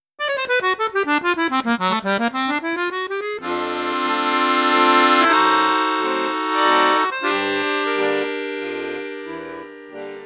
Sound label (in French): registre flute juste, accordeon; cavagnolo virtuose 4